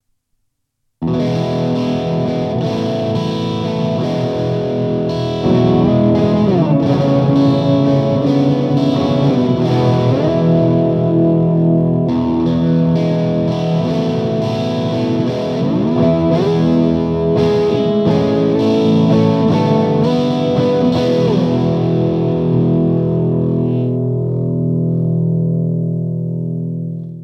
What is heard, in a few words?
MAXON OOD9